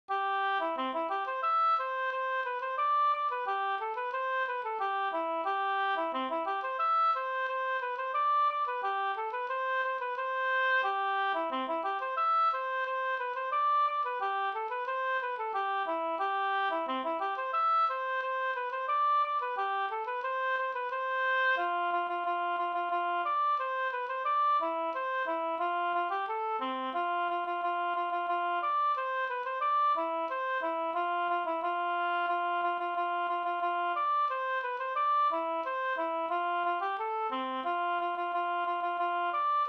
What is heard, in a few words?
polka